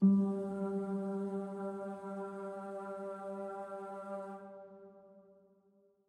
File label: Cave Music Energy Harmony Note G2